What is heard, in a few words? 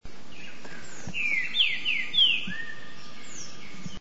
green winged saltator, brazil